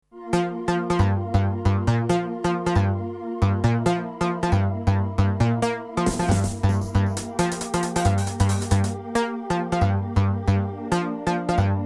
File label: rhythm